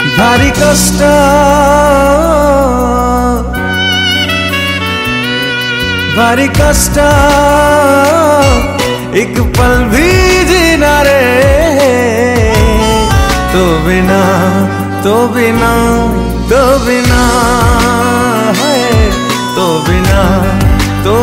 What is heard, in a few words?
Song Ringtone, Odia